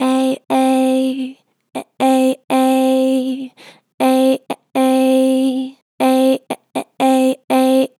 Gentle Vibrato "Eh Eh", Vocals, Natural Vibrato Gentle; Harmor Vocals